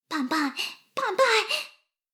しこしこ音声; 牧場戦腺; 少佐殿

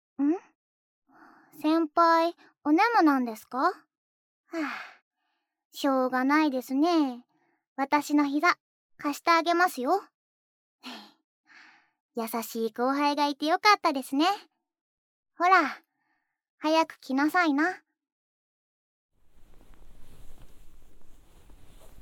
膝枕でお昼寝

